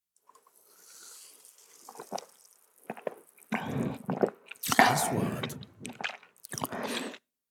صوت شرب غازيات باردة

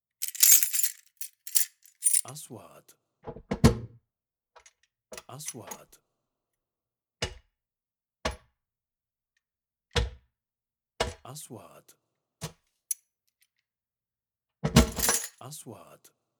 مفاتيح خزنة; sound effect

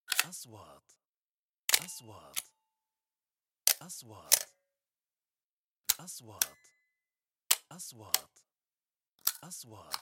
نغمة تنبيه فلاش, transitions

